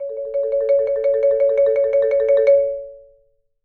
Marimba roll C#4; Sampleheads - Dave Samuels Marimba & Vibes

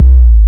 MC SUB, Hip Hop; E-MU Formula 4000 Series Vol. 1; Hip Hop Nation; Big Bottoms

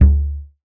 Frame Drum Head, contact mic; Raw

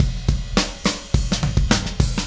Beat Fill, Indie Rock; Sonic Foundry (Sony Creative Software); Indie Rock Anthems

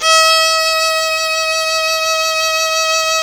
Synclavier Strings; ILIO

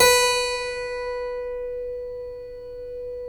B 2 CLAV; USB Soundscan vol.17

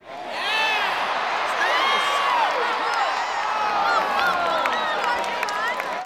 BASEBALL CR, Baseball Crowd; SFX

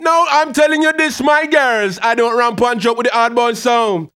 HAL RAGGAM, RAGGA-MUFF A maj

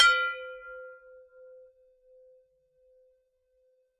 FX BELL; Univers Sons - Raricussions

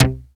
TEXTURED PAD; Kid Nepro; Elite

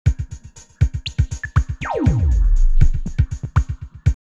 120, drumloops, DrumMachines; X-Static Goldmine

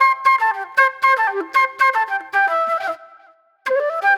Disco Flute; Sonic Foundry (Sony Creative Software); Manhattan Flutes; Session 04 - Cruisin' Disco

